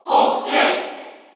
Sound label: Cheer Korean; Fox; SSBU